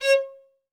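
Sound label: solo violin, strings; ILIO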